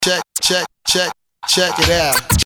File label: CHECK IT OUT SCRATCH 100BPM